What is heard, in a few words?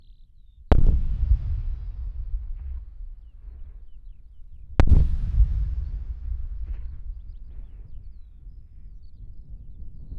Claymore Mines 200m; Brecon 2015; H4N; KU100